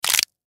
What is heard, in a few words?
Neck Break Human Impact Martial Arts; Combat Voice; Kung Fu Karate Military; Warfare; Fight Voice Clip